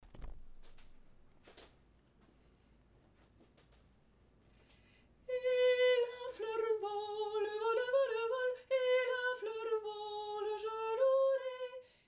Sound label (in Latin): superius; Ateliers chant; Et la fleur vole